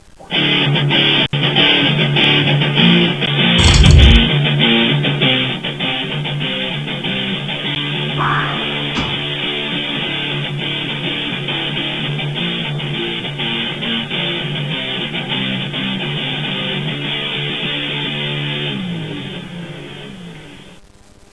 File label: guitar